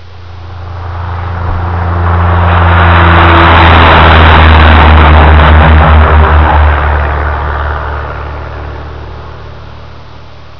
Flyby

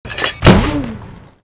forest mortar

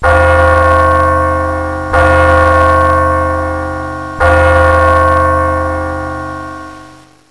3 bell

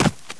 step

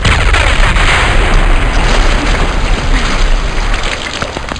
boom, ambience

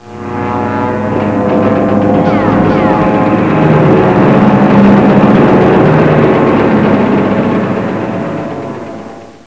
dive crash

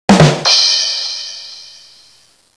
badumching

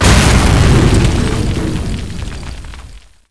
tank kill

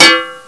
dog only hedgehog

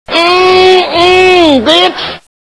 mmm

